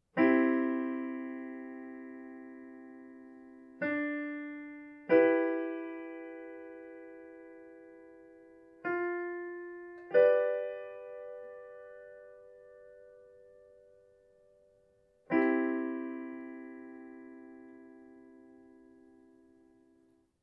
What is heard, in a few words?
chord choices for melody tone G